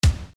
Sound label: instruments drum bass drum; maoudamashii